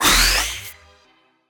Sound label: ice spell buff positive; fvtt sonniss gdc audio